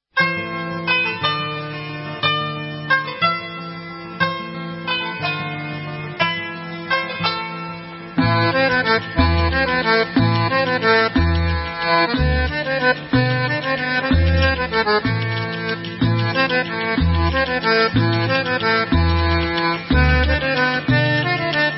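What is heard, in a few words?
Instrumental, Israeli Dances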